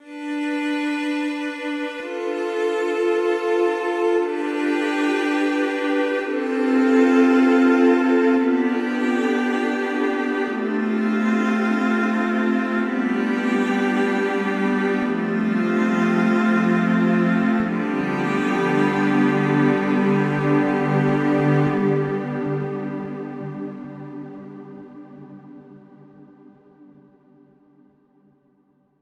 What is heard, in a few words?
Harmonious End